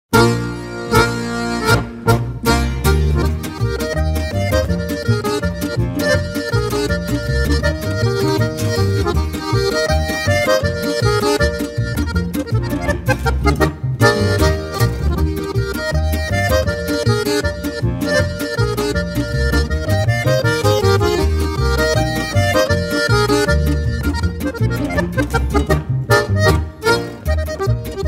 Polka

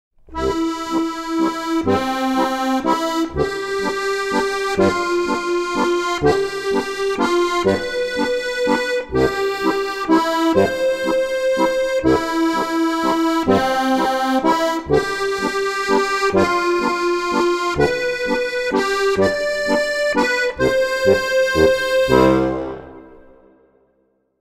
harmonika

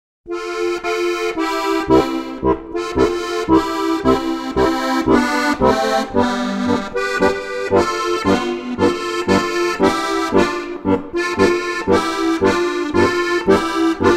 harmonika

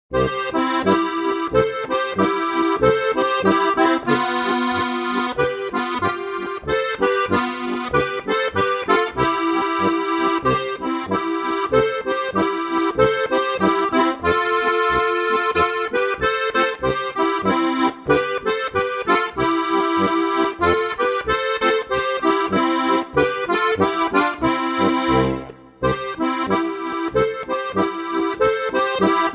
Polka

